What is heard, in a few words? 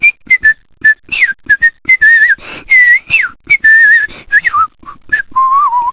Whistle